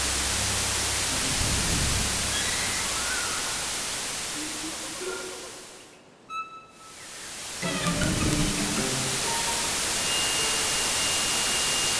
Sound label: Panned Mixed Noise